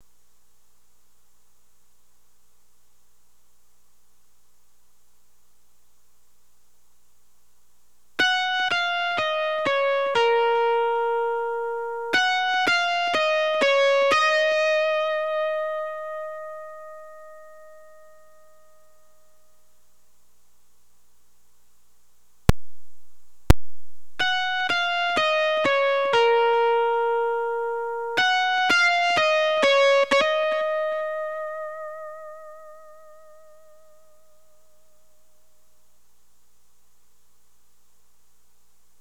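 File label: Guitar Lead Line Recording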